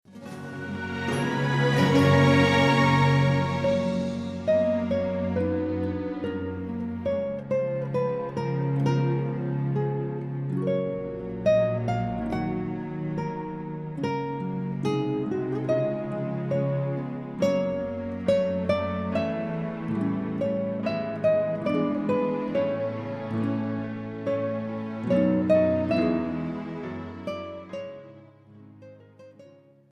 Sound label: wedding bridal chorus, guitar